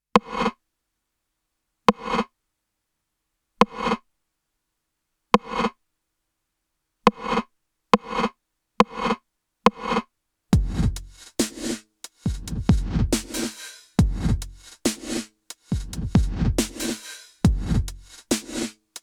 Sony DRE 2000 TR909 Sony DPS R7 Gate Reverberation reverse Envelope